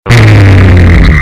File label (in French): hmmm plus fort